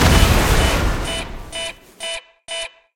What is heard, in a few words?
turret jam; Quake 4 Alpha; weapons turret